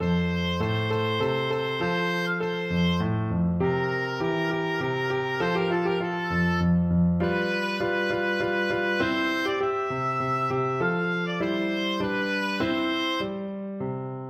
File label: minuet from don giovanni, oboe